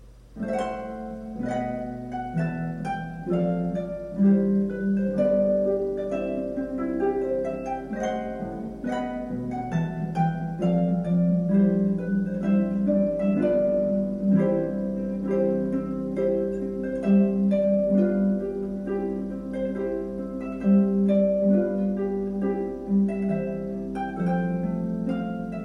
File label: Wedding march Harp